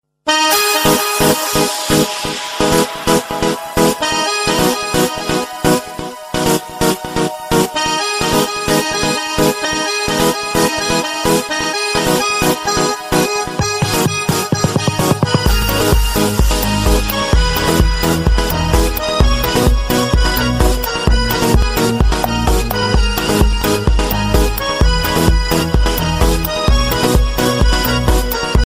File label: your love tune